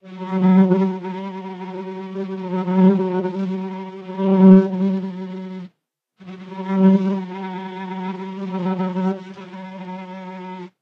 fly single

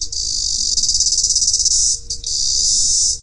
cicada chirp

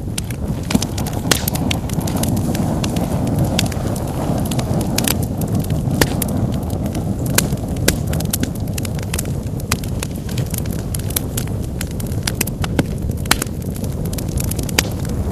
fire crackling

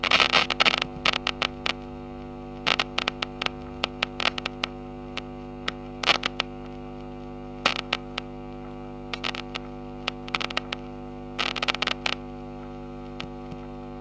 strange signal

